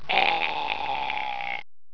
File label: scream